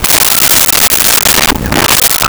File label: LoFi Synthetic Squawk; Vintage Cartoon; Comedy Vocals; SONY PICTURES SOUND EFFECTS SERIES